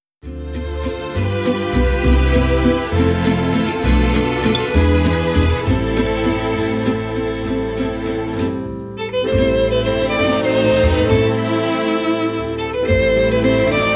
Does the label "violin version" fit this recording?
Yes